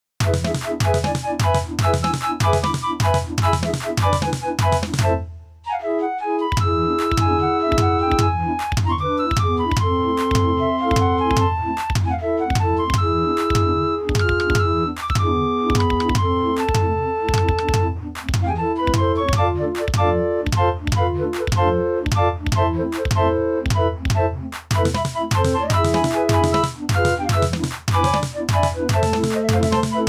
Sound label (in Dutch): Flamenco, draaiorgels